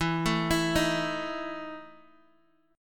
E Major 7th Suspended 4th E down Guitar DADGAD

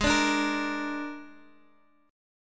Ab Suspended 2nd Flat 5th Ab Guitar Open D

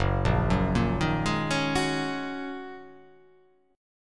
F sharp 6th Add 9th F sharp; arpeggiated; JGuitar

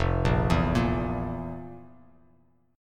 F Major 7th Suspended 4th F; FM7sus4 arpeggiated; JGuitar

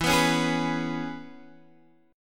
E 6th, down, Guitar, Standard; strummed; capo=6

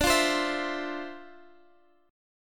D Minor 9th D Guitar Half Step Down

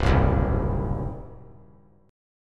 C Diminished C, 3C,3F,3A,3D; strummed; JGuitar

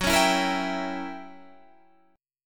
Gb 7th Flat 9th Gb; 14,16,14,15,17,15; Listen to Gb7b9 strummed

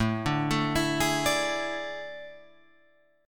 A Major 7th Suspended 4th, Guitar, Open F; AM7sus4; arpeggiated; JGuitar

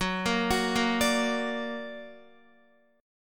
F sharp Augmented F sharp Guitar; F#+ arpeggiated; x,9,10,11,10,10

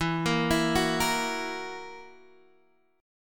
E Suspended 2nd Flat 5th E

